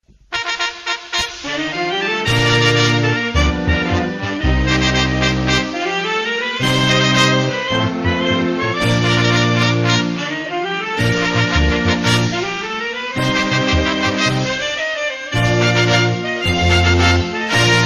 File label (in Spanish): himno de tibu norte